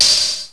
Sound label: ELECT CYM